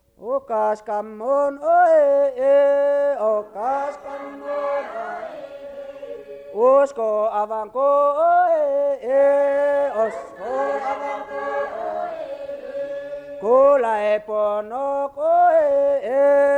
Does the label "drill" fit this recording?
No